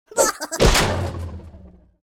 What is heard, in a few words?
Switch Activate; Rabbids Coding